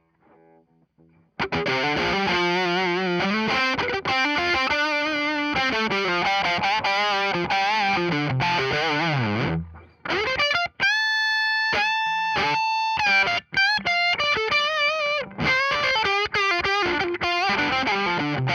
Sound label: Electric Guitar Solo EQ; Daking